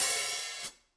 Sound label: room Op Hat, drum